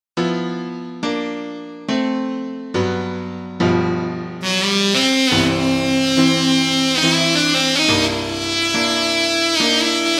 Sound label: Tenor Sax